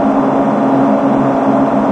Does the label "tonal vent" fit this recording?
Yes